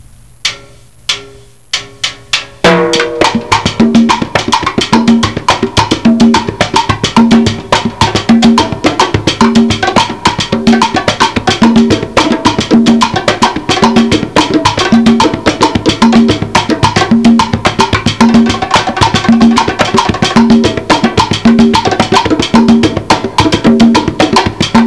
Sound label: Mambo